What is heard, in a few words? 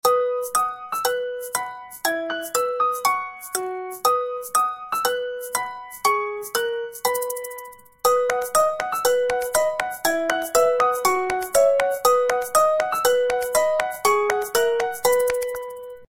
Weather Forecast, alarmas ringtones; LG